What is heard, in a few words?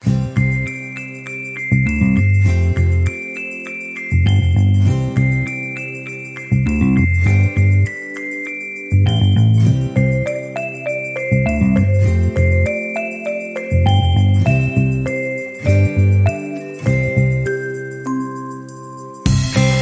Rasta Surprise Upbeat